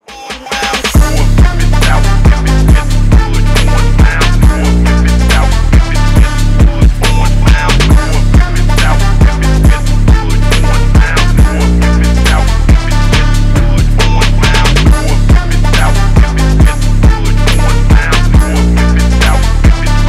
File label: phonk edition